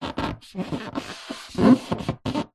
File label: sport surfboard epoxy resin handle squeak creak; zapsplat